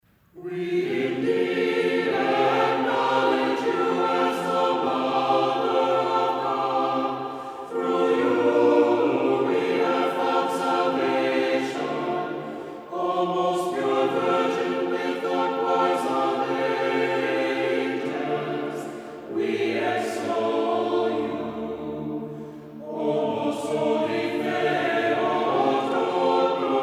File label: Canon Ode 9